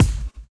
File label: BD; Best Service; Dance Mega Drums